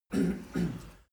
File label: male harrumph; menschen; koerpergeraeusche; soundpool